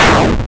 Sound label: hit; pokemon